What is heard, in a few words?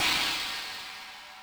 CYMBAL, Yamaha RM 50